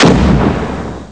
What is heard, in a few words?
EXPLO